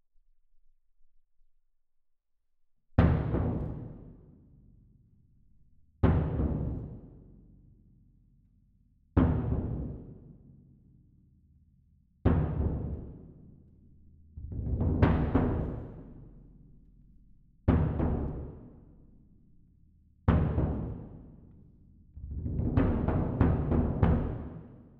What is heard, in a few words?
Perc